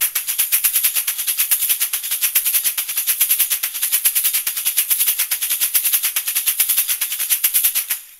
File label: Chocalho